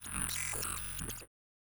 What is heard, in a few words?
GLITCH SCI FI COMPUTER; MatiasMacSD